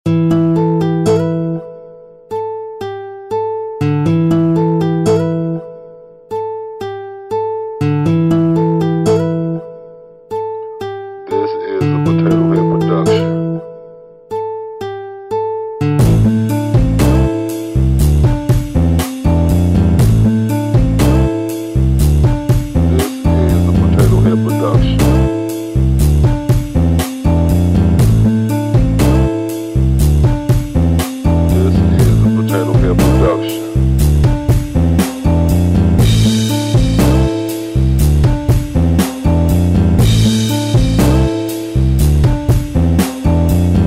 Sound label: Beat